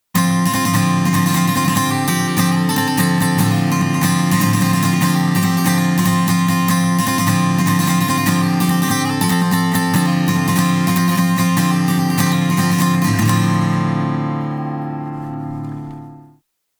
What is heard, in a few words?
Ac Guit comp; Chameleon Labs